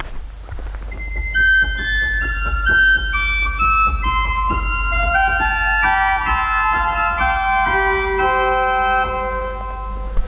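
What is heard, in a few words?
Oktave 2, organ; Vitus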